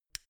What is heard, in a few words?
mini switch turn on